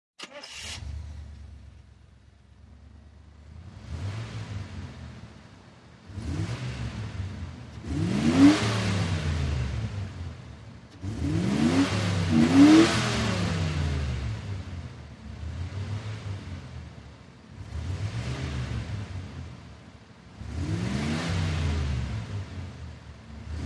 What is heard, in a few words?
90 Camaro On Hard Revs, chevy camaro 1990 ext.